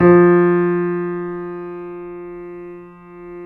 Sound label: KEY, YC7; Rhythm Section